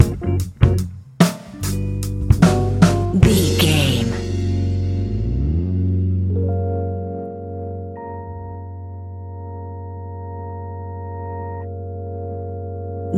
electro groove revolution stinger, A classic piece of Hip Hop and Rap music; perfect for budding rappers, ideal as a beat to rap to